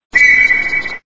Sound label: whistle; FOMA